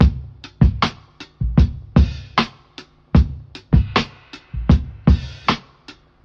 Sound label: Drum loops Boombap